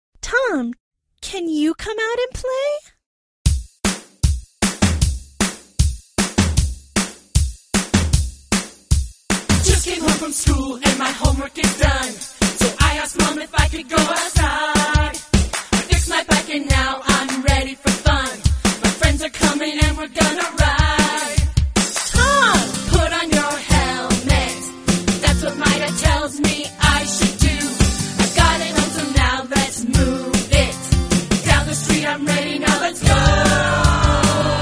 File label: tom